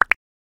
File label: button tiny; ion sound